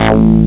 attack

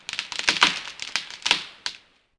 creak, plnt rustle; SIN

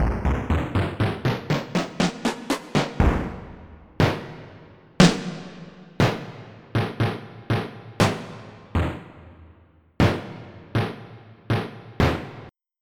Snare Stacks

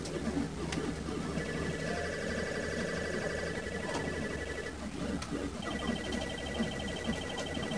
loop; Math Mysteries; Tom Snyder Productions

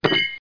dish bounce; DR BRAIN; BUGS

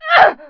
pain; PC Gamer IT CD 41; Daikatana; mikiko

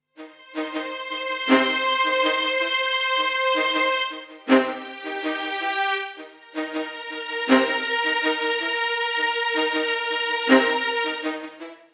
STRING D+B 160 C; eJay; Drum+Bass

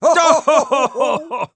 haha

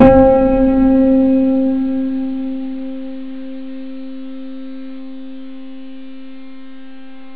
PIANO

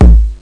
tr-808, bdrum samples

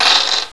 TAK; Alien

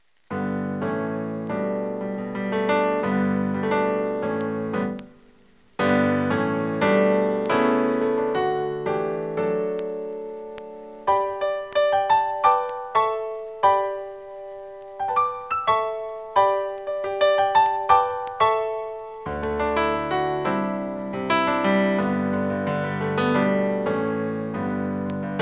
solo piano, clean

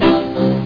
boop music

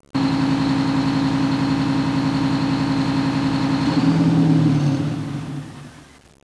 idle, fire; disaster

